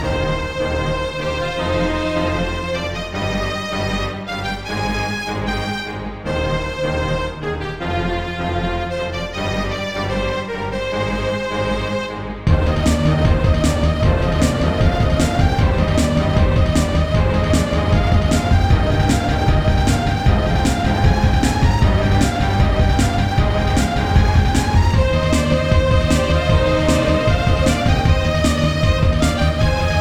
Crash and Burn

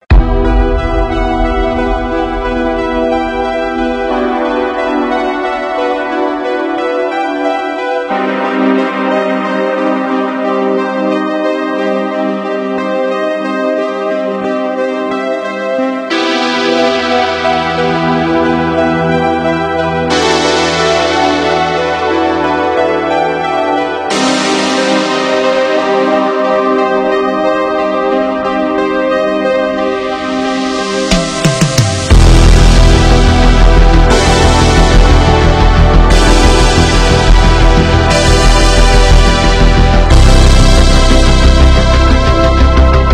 Main Menu Music; Ice Scream

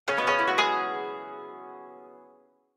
yay; Path of the Teal Lotus

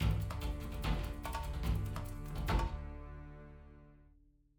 Combat

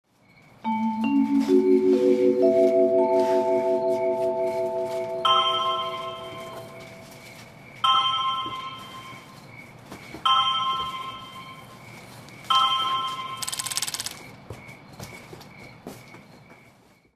bumper; Miss Teri Tale: Vote 4 Me

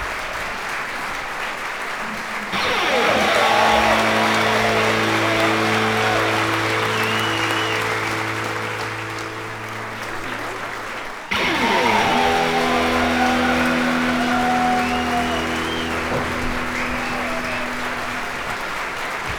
Amb Concert; Level Gary

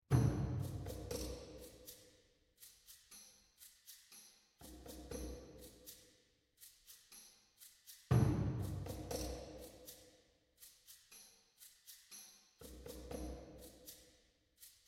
Puzzle Layer; From the Shadows

